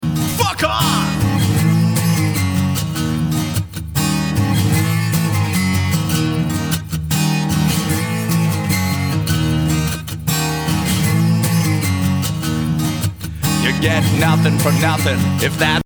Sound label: solo contest backing track